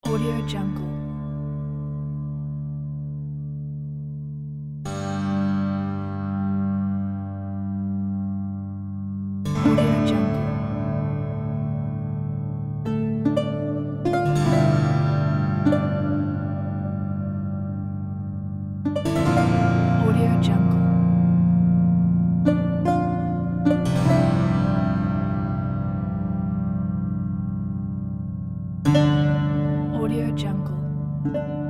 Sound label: medieval games